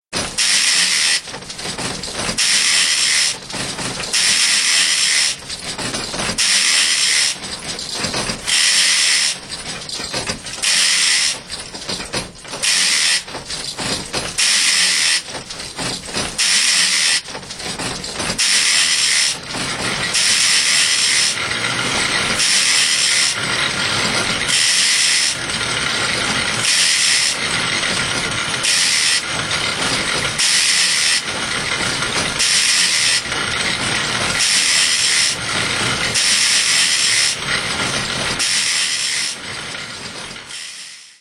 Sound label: 6 machines, hosiery